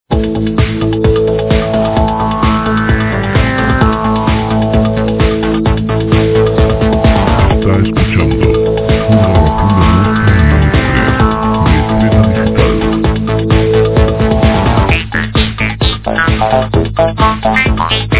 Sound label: anubis dance; musicalibredederechos musica television